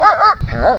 Dog die

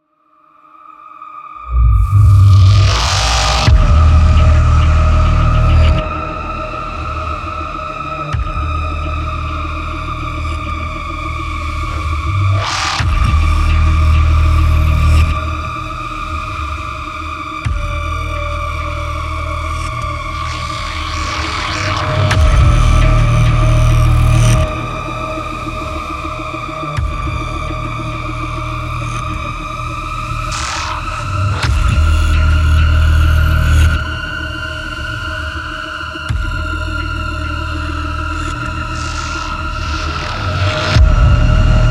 Combat Suite Main Ambient; Super Gore Nest

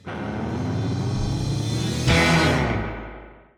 Stingers; Disney Infinity

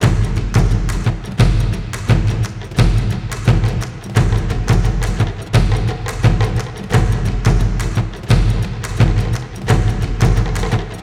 Siege Field Drums; Ninjago Monastery; lego universe macos windows gamerip 2010

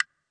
Lift; Trivia Crack; Android

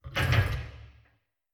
lever back; Kismet

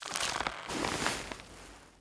liana; Through Abandoned 2: The Forest

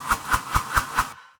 Attack Swishes; Rebel

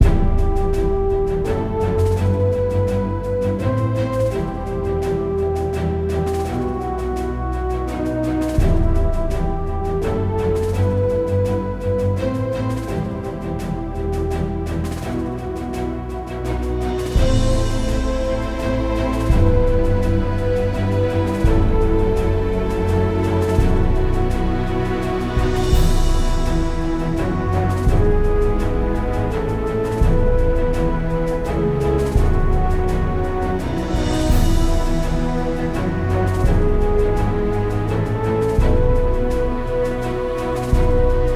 Victory LOOP; Silent Hunter 3

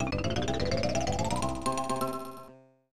Section Completed; Super James Pond; SNES

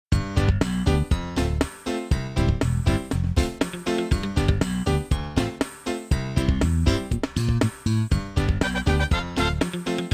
Jazz Train; 3D Ultra Lionel Traintown Deluxe

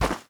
grass; anna; devour

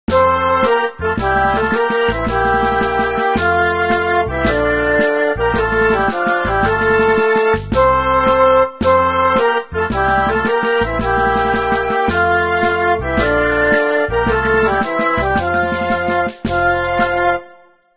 Victory Ceremony

